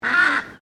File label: Birds Ibis Australian White Mature Honk; Birds from South Australia; Animals